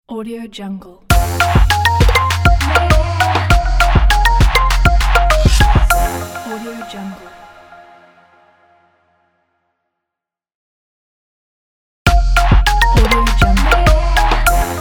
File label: trap fresh opener